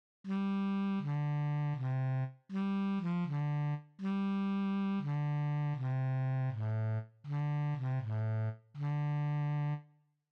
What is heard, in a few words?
jazz